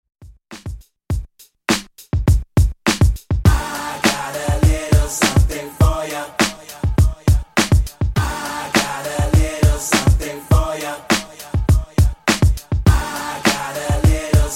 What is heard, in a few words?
Dj Intro Outro 102 Bpm